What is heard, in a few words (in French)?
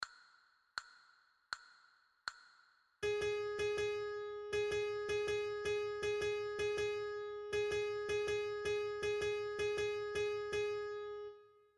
Sol diese, flute a bec